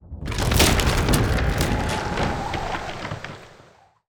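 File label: siege death; Onager, Ballista, Ram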